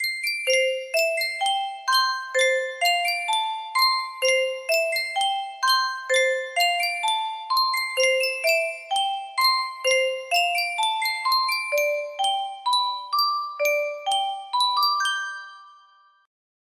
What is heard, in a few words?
music box, music box melody